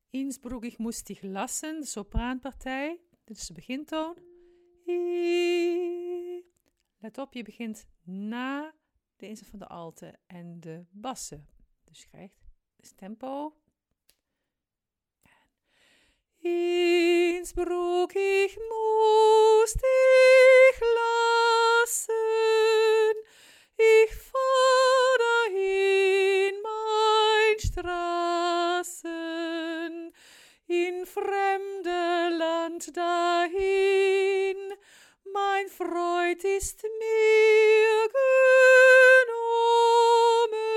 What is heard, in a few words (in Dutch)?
sopraan; innsbruck